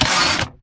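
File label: Stalker Movement Servo Damaged Head pivot Short; Titanfall 2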